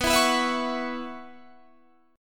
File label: C sharp Minor 11th B